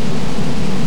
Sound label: Spin In Air Loop; gamerip; Watcher Knight